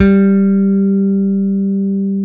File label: P BASS G4, pick bass